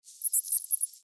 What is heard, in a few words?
shrew